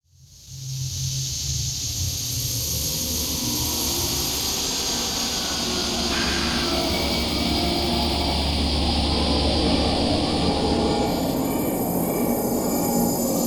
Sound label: Spooky Ambience; Imaging Elements; Module Production Element Title Imaging